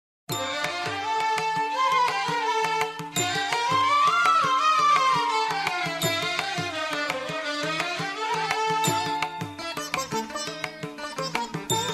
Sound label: Hindu Devotional Karaoke